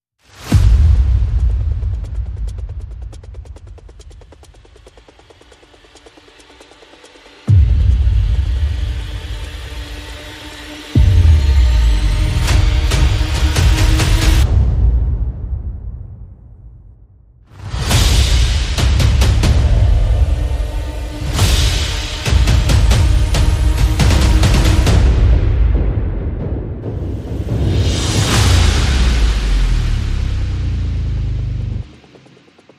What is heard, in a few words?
Perc and FX